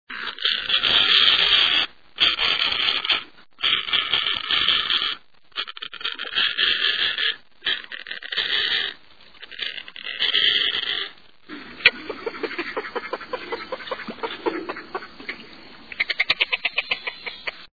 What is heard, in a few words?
Snowy Egret, songs